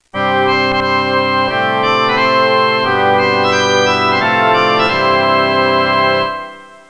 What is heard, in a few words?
ORGAN; Instrument; Talking E-Mail